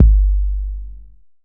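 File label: bd, 808 bass drum; Best Service Gigapack 2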